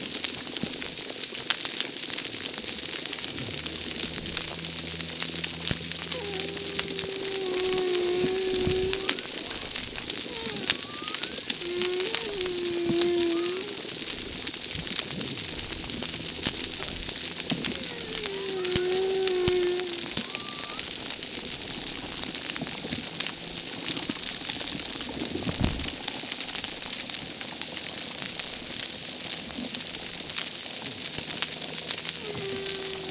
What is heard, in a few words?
humback whale; SUBSEASOUNDSCAPES; tara